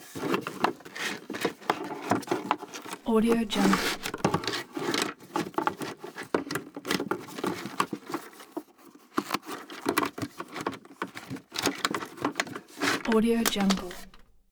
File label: cupboard office